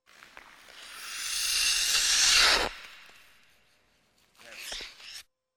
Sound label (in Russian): priblizhenie i rezkaja ostanovka; Звуки катания на коньках